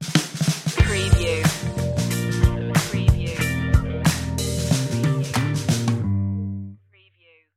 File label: sweet groove stinger